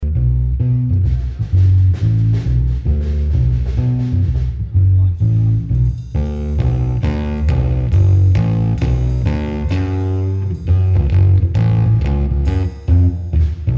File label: Cash Blues